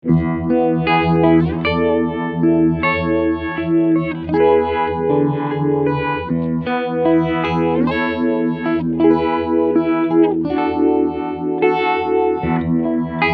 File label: Phase U Vibe Mix